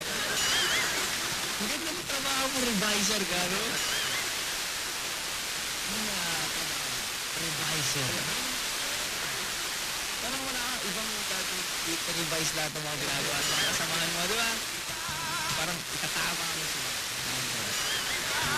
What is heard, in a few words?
Pinas FM 95.5MHz; DWDM